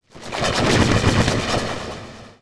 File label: weird